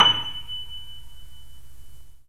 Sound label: Yamaha C7, 3D Pianos; E-MU Producer Series Vol. 5